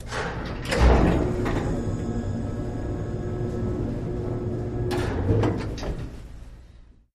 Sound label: Utility Elevator, elevators